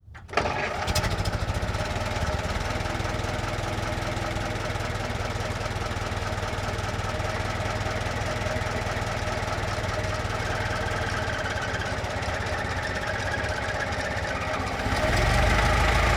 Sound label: 1924 Ford Stake Bed Truck Ext Start Idle Slow Engine Revs; Period Vehicles